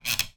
wood squeak creak; foley; zapsplat

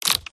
Micro Mechanics; PMSFX

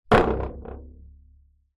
impact metal table leg vibrate; zapsplat

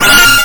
sound design radio music burst

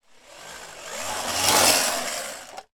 toy motorcycle or car push past; sound effects one

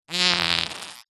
cartoon fart

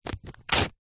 paper rip thin magazine page; foley

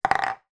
household plastic childs cup down on table

